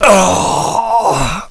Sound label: death